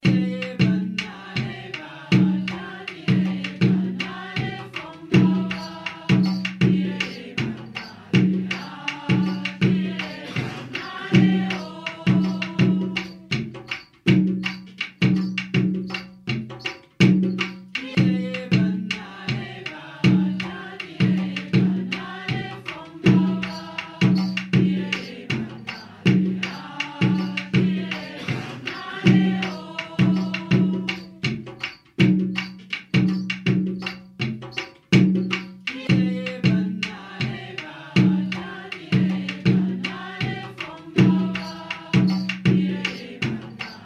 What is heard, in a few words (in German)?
Diabana Lied Loop, djembe